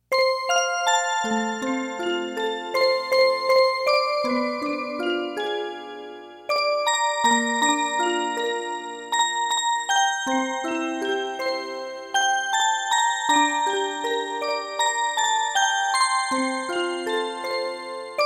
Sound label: musicbox